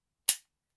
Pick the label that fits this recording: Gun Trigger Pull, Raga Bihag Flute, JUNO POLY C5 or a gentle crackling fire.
Gun Trigger Pull